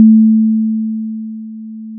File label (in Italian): Corda freq